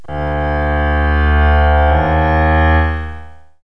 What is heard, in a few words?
Suspense Bridge; KABOOM! - Wild and Wacky Sound Software; Music; Drama & Mystery